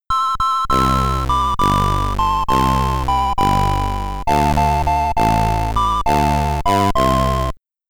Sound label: Netherlands (SID Stereo)